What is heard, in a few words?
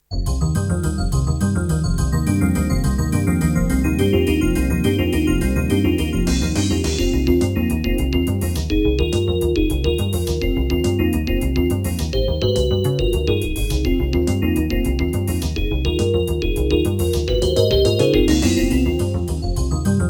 Underwater AWE32 GM; Teenage Mutant Ninja Turtles